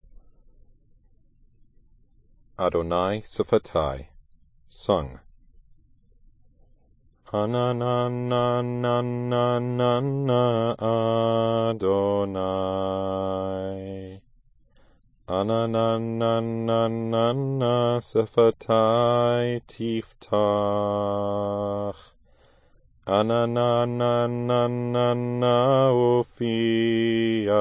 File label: adonai sefatai sung